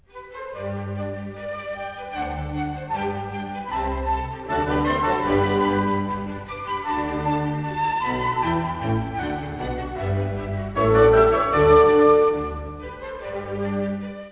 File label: mozart piano